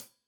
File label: Hat Tight, Hi-Hat, Cymbals; Deeply Sampled Drums